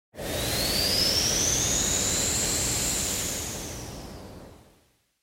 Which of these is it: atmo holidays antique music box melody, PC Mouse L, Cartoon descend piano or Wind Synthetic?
Wind Synthetic